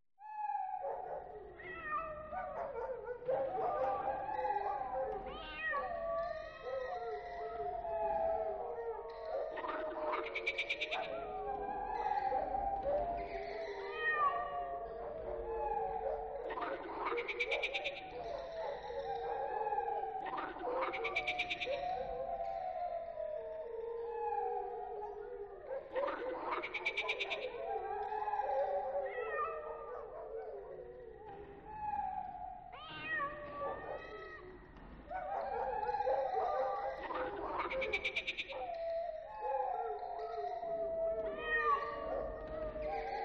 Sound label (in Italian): Suoni notturni di animali